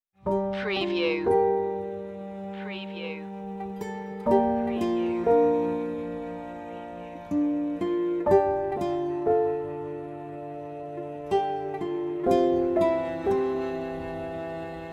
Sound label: relaxation no sound fx